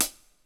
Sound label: STEREO DRUMS